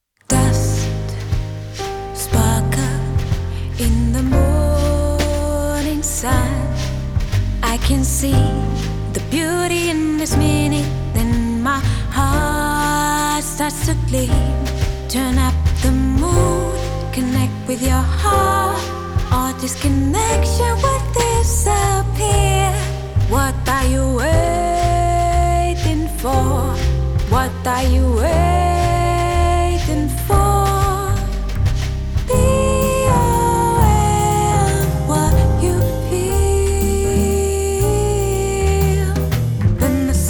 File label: Just Believe Fullmix Vocal